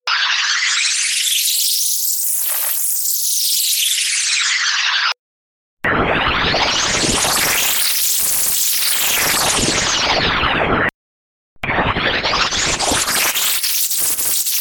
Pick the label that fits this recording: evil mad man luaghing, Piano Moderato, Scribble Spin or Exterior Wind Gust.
Scribble Spin